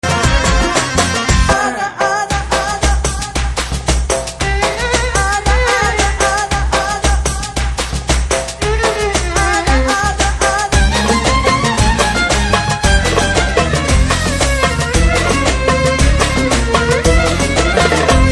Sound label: BG Drum Beats Ringtone